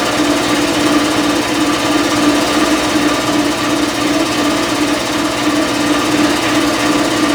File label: Air Loop, Ambience; Warframe; Tau